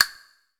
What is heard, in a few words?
bounce hard, marble; KevinBlast